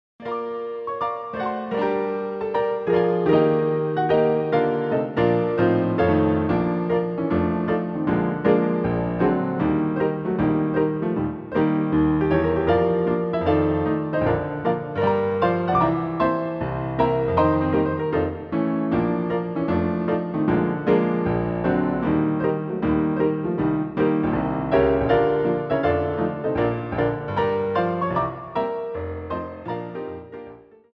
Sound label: piano roll